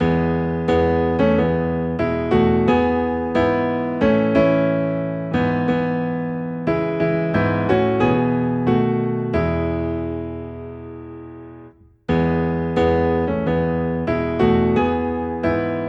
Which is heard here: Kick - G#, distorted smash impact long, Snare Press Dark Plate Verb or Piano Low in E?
Piano Low in E